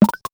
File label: lame button